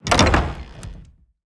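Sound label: wood double open, door; skyrim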